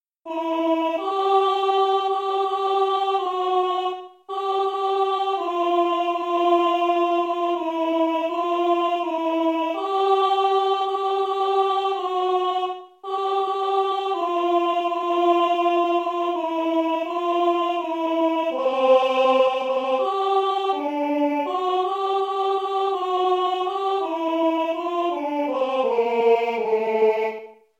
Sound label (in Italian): Coro Alto; Willaert; O bene mio